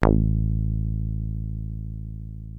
303 A1, TB 303; Hollywood Edge - Giorgio Moroder Rare Synthesizer Collection

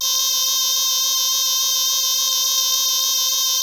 PPG VOX D C6; DIG VOX; Music Store - Claudius Diemeter Schwerlos

